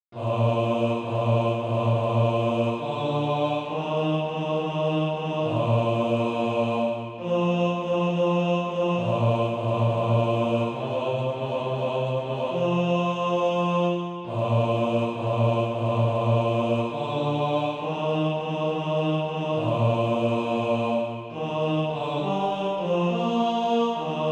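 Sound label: bass, Sheet music (SATB) including Practise Music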